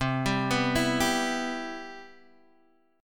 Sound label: C Major 7th, C, chord